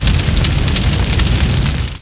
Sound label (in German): feuer; 1602 AD